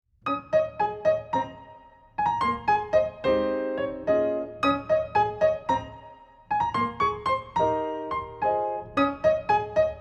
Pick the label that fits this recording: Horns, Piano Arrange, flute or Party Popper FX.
Piano Arrange